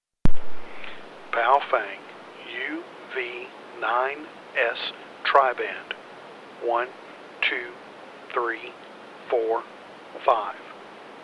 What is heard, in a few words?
baofeng uv9s